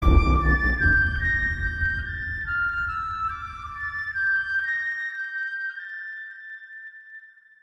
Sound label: Sms Tone; Billa 2